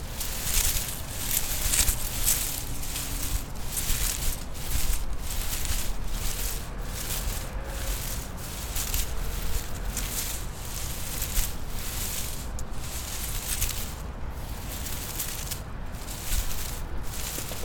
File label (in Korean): 회양목